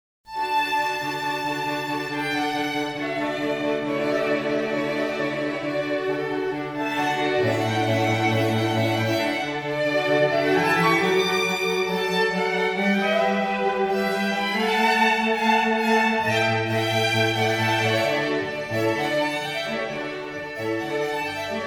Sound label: quartet distant mic